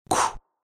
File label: blockstun